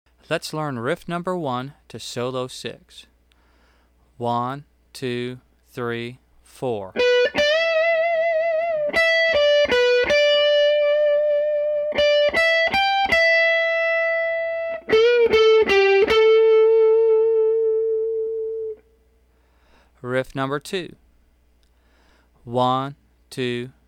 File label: Vibrato Riffs